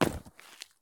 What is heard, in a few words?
landing asphalt; Escape from Tarkov